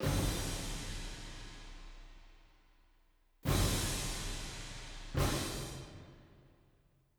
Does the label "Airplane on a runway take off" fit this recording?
No